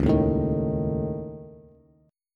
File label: Db Suspended 2nd Sharp 5th, Bass; 9,6,7,8; strummed